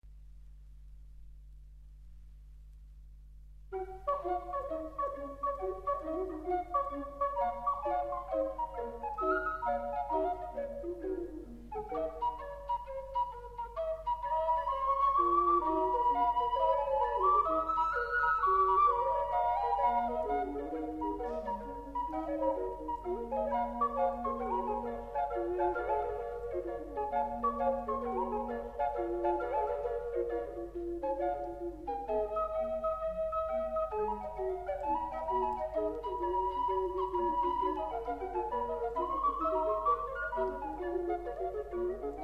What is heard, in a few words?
Jig